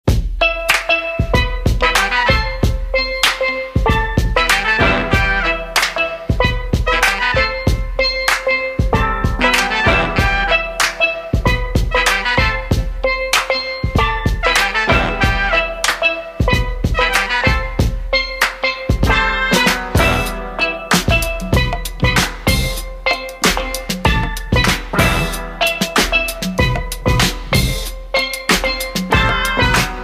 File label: Steel Band